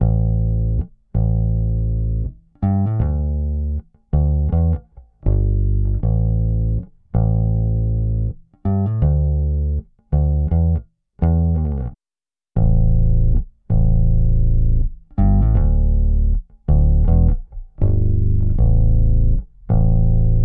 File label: bass